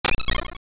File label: Wizzrobe Shoot Ice; Wizzrobe shooting an ice blast